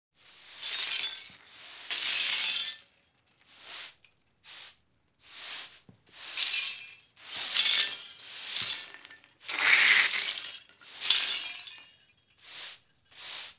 Stekla podmetajut

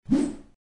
Strela

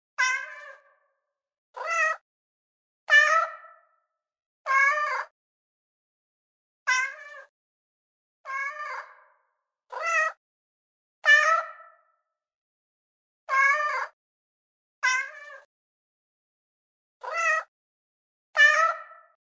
Myaukane

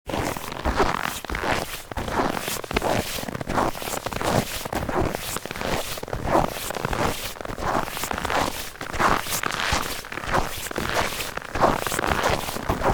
Po snegu shagi

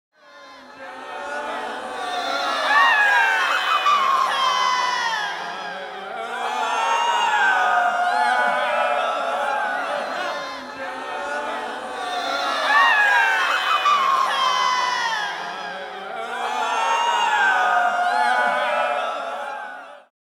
Kriki uzhasa muki

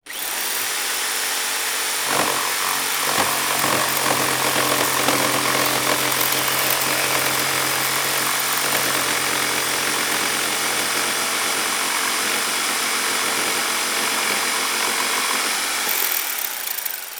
Perforator

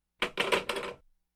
Kladut trubku

